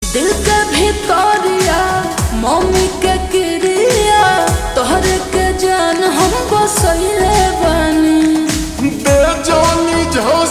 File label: Ringtone Slowed Reverb